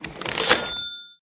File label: Boxes move in